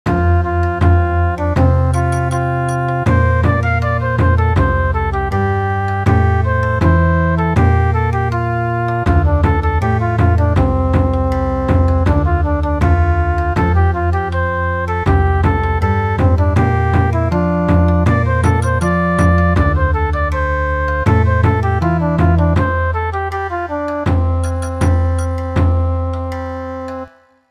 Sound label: Bon Odori, midi traditional